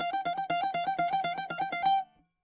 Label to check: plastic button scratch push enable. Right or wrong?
Wrong